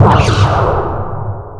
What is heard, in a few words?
weapon fire